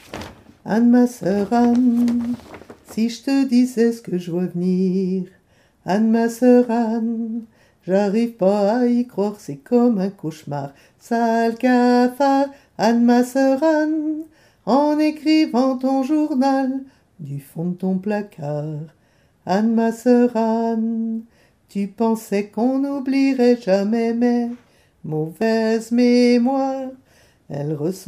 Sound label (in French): anne tenor